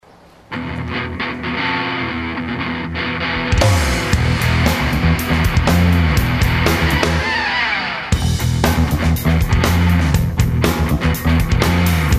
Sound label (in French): musique chanson instru